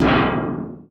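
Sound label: BIG TIN, percussion metallic; Rarefaction; Poke in The Ear With A Sharp Stick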